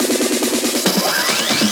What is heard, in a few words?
Fills, Loops 140 BPM